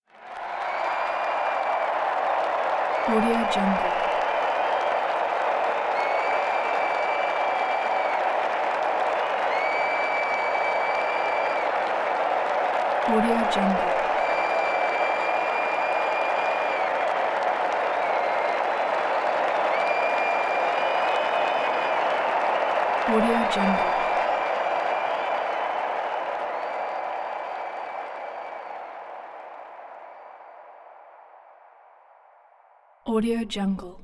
crowd huge cheer

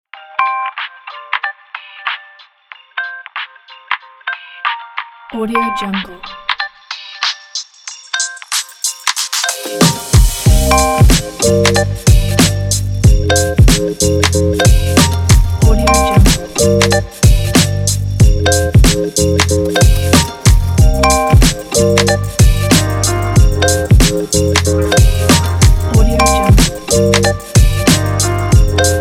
abstract chill hip hop

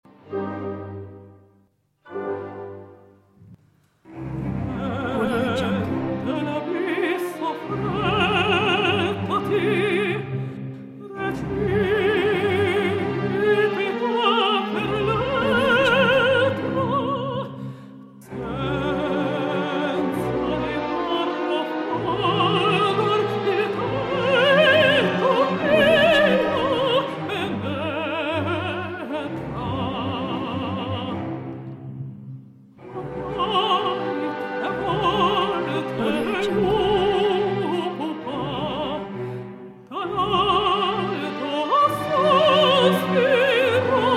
dramatic verdi opera

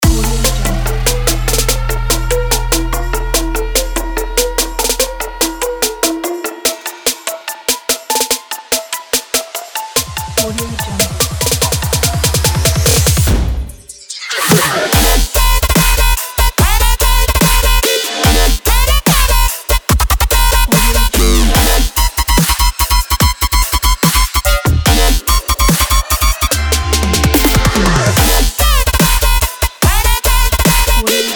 sport dub step

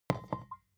object glass vodka put down tipping hard; smartsoundfx

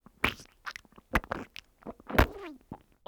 bubbling gurgling gore juicy mouth pops; prosoundfx

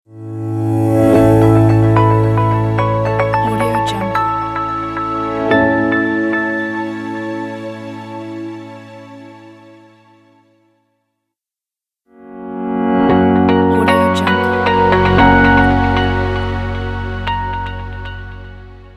corporate ident pack; densostudio

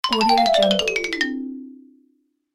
marimba fall; medusamusiclab

